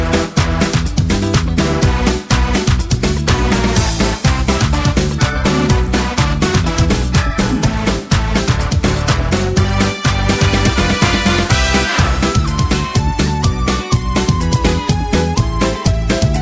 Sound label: Instrumental; K-Pop